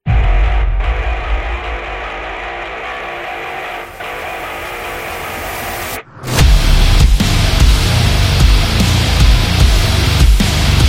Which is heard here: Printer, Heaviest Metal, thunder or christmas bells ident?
Heaviest Metal